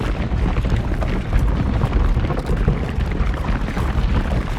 Ability Prison Travel; Evolve; Behemoth

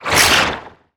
Attack Slash; Evolve; Wraith

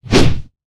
Melee Whoosh; Evolve; Goliath

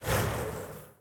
Burning Hands Whoosh Tails

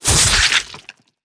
Ability Spider Trap Activate Spit; Lurker; Evolve; Gorgon

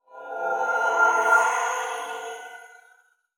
Teleporter Abduction Fail; Evolve; Wraith

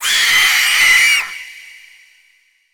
Spider Trap Emerge; Evolve; Gorgon

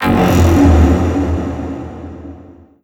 strider fall; Strider Remake

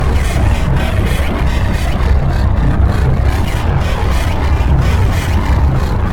Banshee Missile Travel; Evolve; Elder Kraken

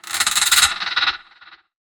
Lurker Sniff; Evolve; Gorgon

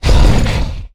Sniff; Evolve; Goliath

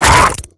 Exertion Light; Evolve; Gorgon

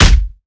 Melee Impact Base; Evolve; Behemoth

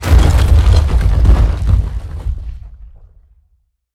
Rock Prison Collapse; Evolve; Behemoth

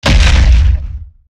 Impact Swt; Evolve; Meteor Goliath

